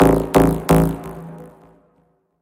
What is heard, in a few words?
hard style tone